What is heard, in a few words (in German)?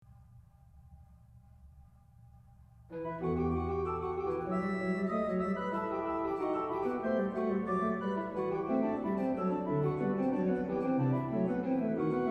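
Wo soll ich fliehen hin, Orgue; BWV 646; Schuebler; Enregistré le 4 avril 2020 sur l'orgue virtuel Klapmeyer de l'église St. Nikolai d'Altenbr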